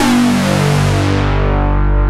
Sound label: P-5 SYNC G1; E-MU Formula 4000 Series Vol. 3; Analog Odyssey